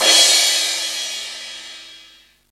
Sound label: Crash, Akai MPC-60